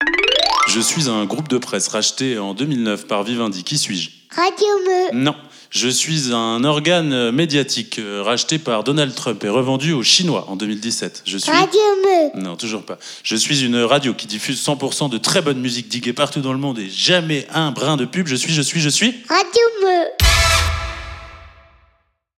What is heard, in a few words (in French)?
jingle; question pour un champion